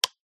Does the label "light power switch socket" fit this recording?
Yes